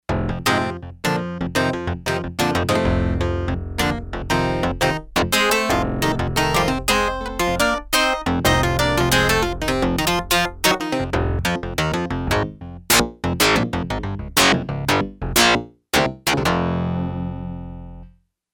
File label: Double Deep Clavi, Yamaha TX802; Performances; Factory Patches